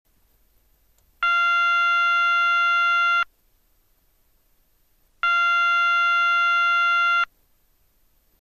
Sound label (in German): ton, Signalgeraete